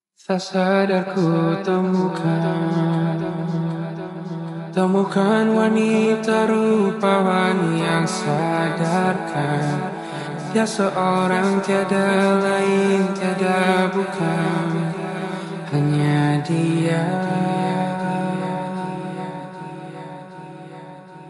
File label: Acapella